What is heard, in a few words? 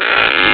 DOOR